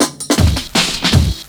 LOOP SD, Drum & Bass, 160 BPM

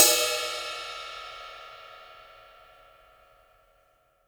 CYM, ROCKRIDE CYM

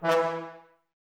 brass, Woodwind Brass Ensemble

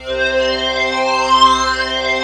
ST PAD1 C5, SYNTHS, House Side Of 2 Step; USB Soundscan vol.51; AKAI

